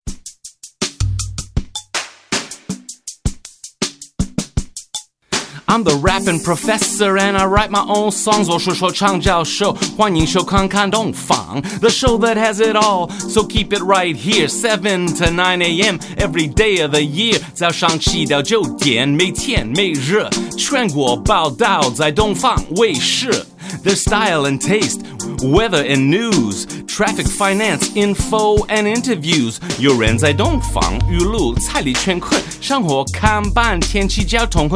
rap

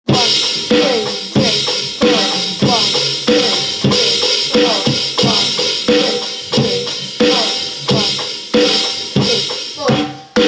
drums

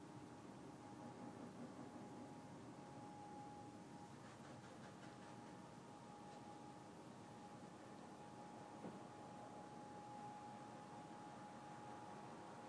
carpet cleaner

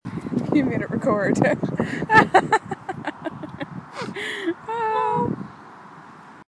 we laugh in harmony

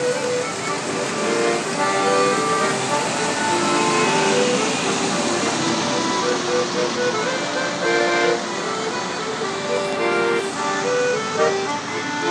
drunk accordion player tackling jingle bells; in stockport

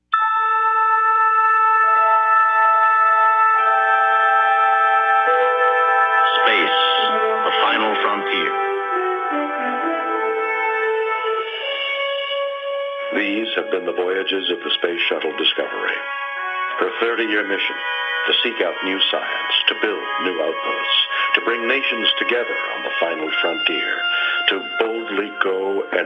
wake up call; sts 133 flight day 12

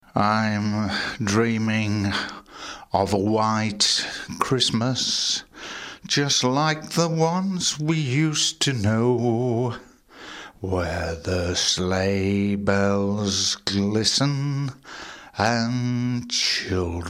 sports reporter tests his microphone by singing; Radio Jersey

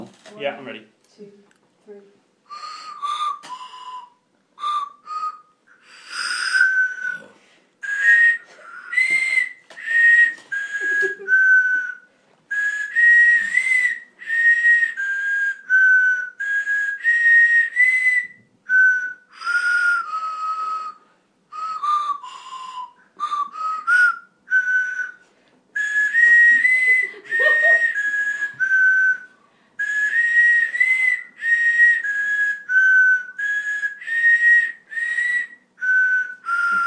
christmas carols on whistles from crackers

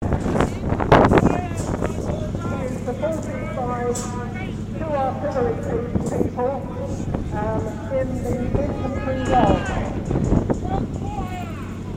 25pr sexton self propelled gun firing

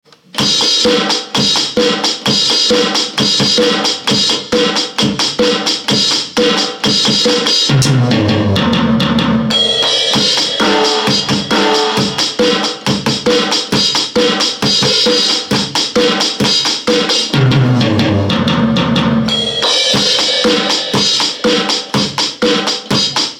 charlie drums

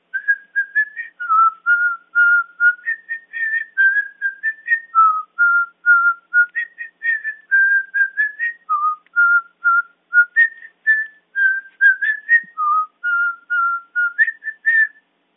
whistling on video

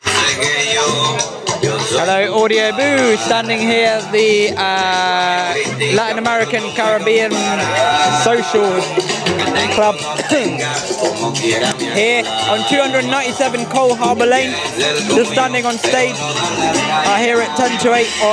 gig vibe

